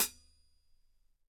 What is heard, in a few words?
HH Closed; Digital Sound Factory